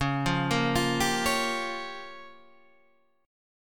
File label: C sharp 6th Flat 5th C sharp down Guitar DADGAD